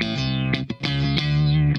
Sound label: SHOT, Funk Guitar; 90 s Sample CDs; Zero G